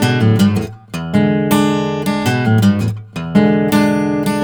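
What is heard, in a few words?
baritone riff, Blues Guitar; ILIO; Fretworks; 108 BARI RIFF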